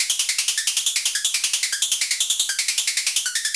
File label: fast bell loop, VCS beats; Zero-G Ambient 1